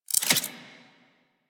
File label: Reload, Weapons; Warframe; Zylok Prime